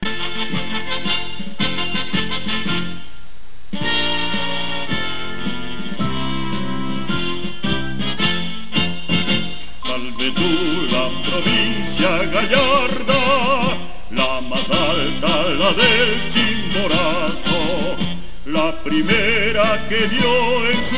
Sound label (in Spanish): Himno Provincia Chimborazo